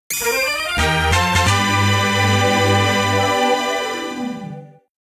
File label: Event Item Getting Jingle; The Legend of Valkyrie